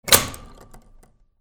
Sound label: GARAGE